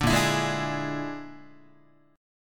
A sharp 7th Flat 5th, Guitar chord; A#7b5 strummed; JGuitar

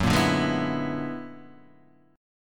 B-Minor Major 13th

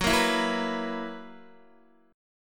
Gb 11th Gb down Guitar, Gb11 chord; Gb11 strummed; JGuitar

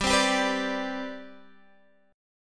C Major 7th Suspended 2nd G, chord

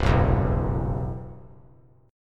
C Major 7th Flat 5th C; CM7b5 strummed; JGuitar

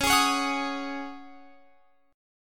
Db Suspended 2nd Suspended 4th Db Guitar Open F; strummed; x,20,20,20,21,22

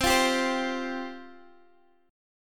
C Suspended 4th C, Guitar, Open G

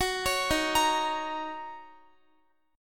Eb Minor 7th, down, Guitar; Ebm7 arpeggiated

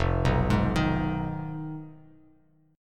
F Minor 7th Flat 5th F down Bass Standard; Fm7b5 arpeggiated; JGuitar

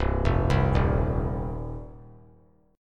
C Minor Double Flat 5th C down 3C 3F 3A 3D; Cmbb5 arpeggiated; JGuitar

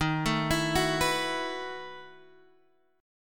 E Major 7th Suspended 2nd Suspended 4th

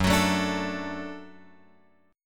F sharp Minor Sharp 5th F sharp; F#m#5 Chord {2 X 4 2 3 5} strummed

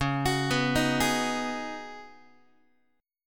C sharp 9th Suspended 4th C sharp Guitar; arpeggiated; chord; x,9,9,9,7,7

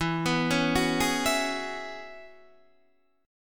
E 6th Add 9th E down Guitar DADGAD

